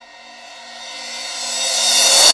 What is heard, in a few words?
Cymbolic; Total Drum&Bass; 90 s Sample CDs; Hits & Bits